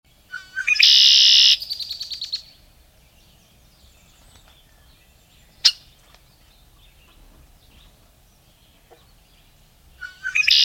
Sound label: red winged blackbird; emiquon virtual tour; birdwatching; illinois